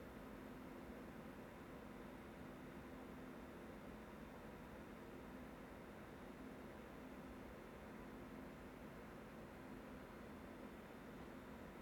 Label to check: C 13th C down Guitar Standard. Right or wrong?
Wrong